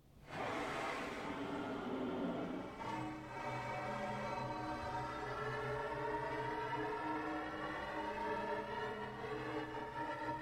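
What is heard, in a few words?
Water Spray Interior Car Antenna; Car Wash; Shake; Spraying; Objects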